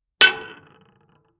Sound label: big wood chunk impacts, blunt impact